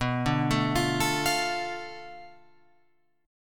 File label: B Minor 7th Flat 5th B down Guitar Open F; Bm7b5 arpeggiated; JGuitar